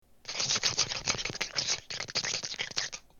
slurp

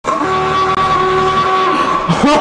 alarm

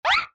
throw sound

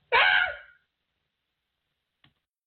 screams

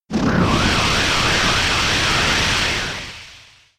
sand storm

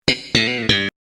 sitcom transition bass riff; seinfeld

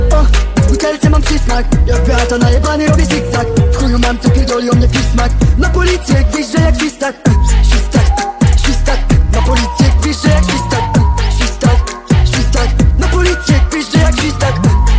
nightcore

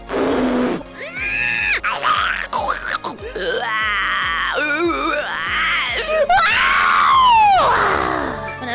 weird crazy noises

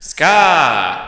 ska

